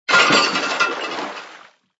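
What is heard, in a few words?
sounds of anchor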